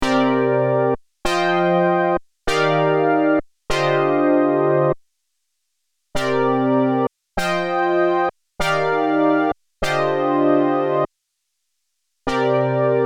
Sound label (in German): Juno 60 Plugins Presets Vergleich